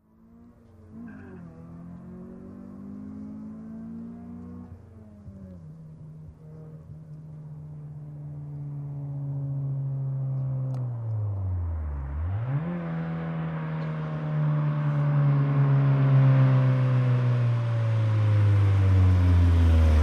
Integra long approach, medium speed arrive, by, off, Acura Integra